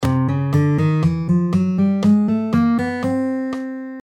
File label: Chromatic Ascending, guitar